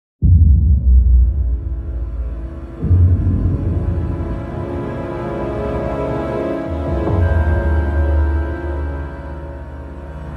Suspense Theme; The Amazing Spider-Man

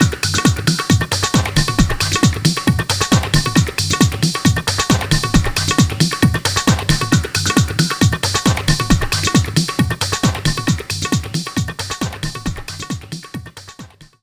Beat Only; rayman arena; Crypts

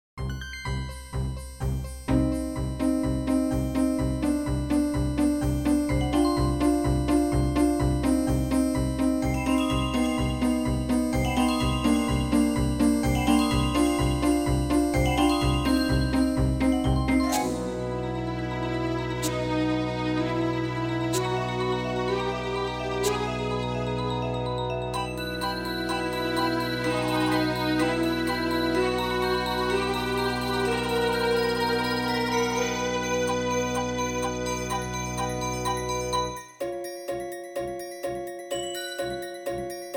Negative; The Witch and the Warrior; RPG Maker

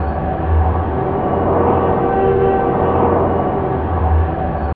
Atmos; In the Line of Duty Firefighter

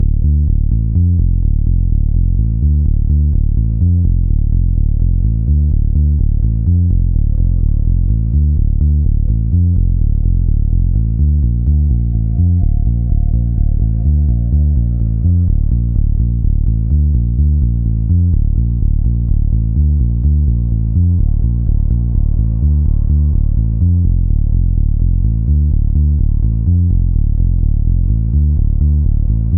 arp bass

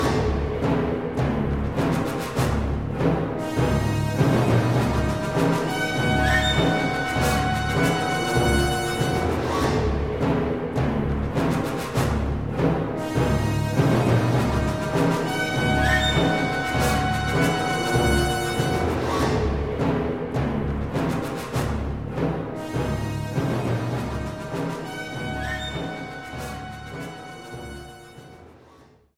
Action Stinger

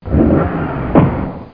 Car

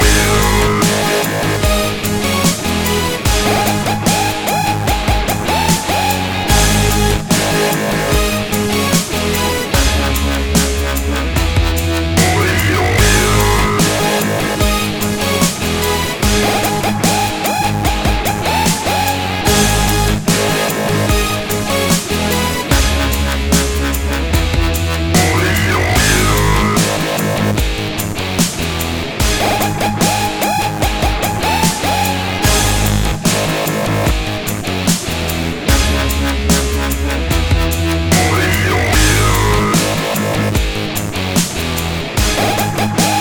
Dubstep Loop; LEGO Movie Videogame; Batman

